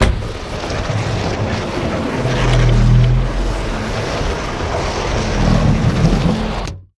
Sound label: Door Open